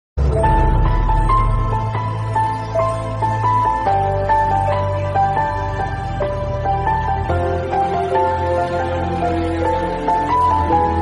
ringtone simple ringtone instrumental ringtone bgm short